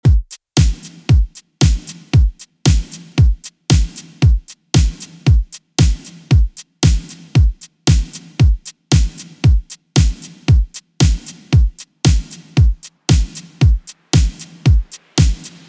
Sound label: 80s 115bpm; Redrum